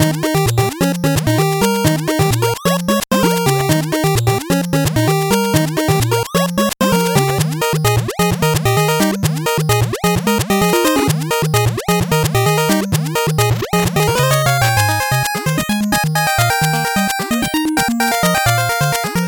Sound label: 8bit bgm fast